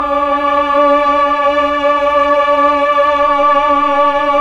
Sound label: PAD, Melo. Str+Vox, Slow Str+Vox; Roland LCDP09 Keys of the 60s and 70s 1